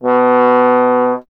trombone; Miroslav Vitous; Solo Instruments VOL-1

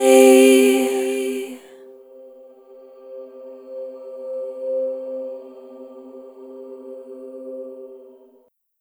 Chord C-G 5th; Sonic Foundry (Sony Creative Software); Pocket Diva World Class Vocal Samples

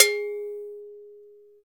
TOTOSAN LOW, Percussion; ILIO; Synclavier Percussion 2; World & Orchestral

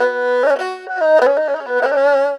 ERHU LICKS

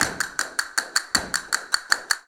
CASTANETS, Flamenco; Best Service ProSamples vol.46; AKAI

